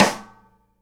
SIDE STICK, Live Snares

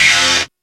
RAVE

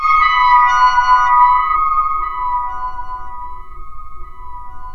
SWIRL, AX-60; Rubber Chicken Software Sample Bank

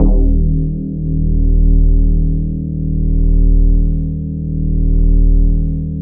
E HARP R, DX HARP

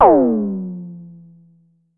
Drum X Tom; Pearl Drum-X

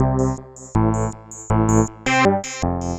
Damos Bass; Sonic Foundry (Sony Creative Software); Knutrix Drum & Bass

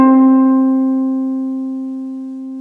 HARP C3

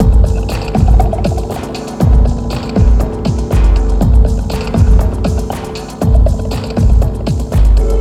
Ambi Groove

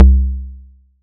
SY TAKBAS; ModelCycles

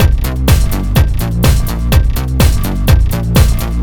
125 bpm full, combi loops, nu dance electro; Zero-G; Soundclash; Kaos Theory

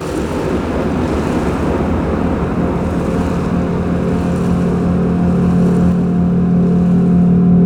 Enviornmental; Sonic Foundry (Sony Creative Software); Ugly Remnants VOL-2; UR 2 FX (Long)

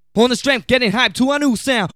VOX SUNG; AMG Megabass REMIX! VOL-2

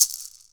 PUILI, HAWAII PERC; AKAI S6000 CD-ROM; WorldSounds

